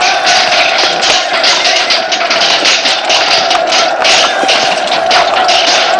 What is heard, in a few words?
Melee; Waterloo